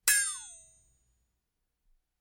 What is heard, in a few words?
Funny anime crash hit